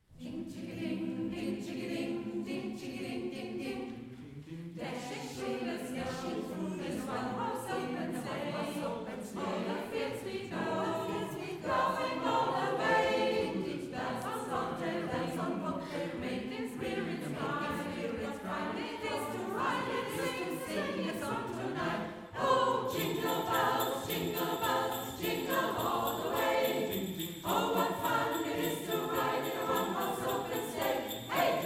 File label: Jingle Bells; Adventskonzert 1000 Sterne 2025